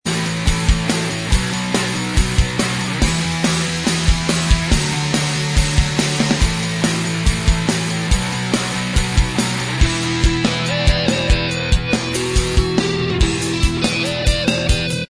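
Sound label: instrumental track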